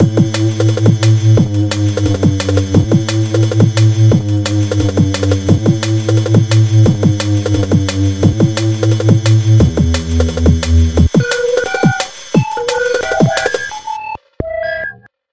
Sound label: British techno